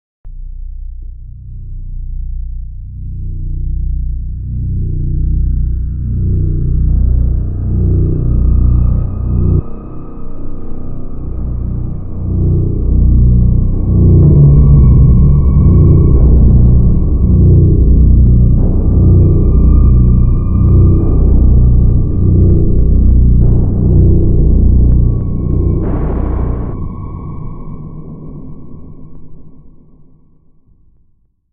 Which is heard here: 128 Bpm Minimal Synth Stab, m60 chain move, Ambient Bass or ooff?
Ambient Bass